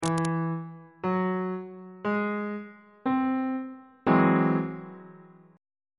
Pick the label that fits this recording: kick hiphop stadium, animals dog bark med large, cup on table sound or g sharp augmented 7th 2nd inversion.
g sharp augmented 7th 2nd inversion